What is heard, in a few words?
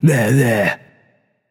nv tongue; smite; voicepack; hachiman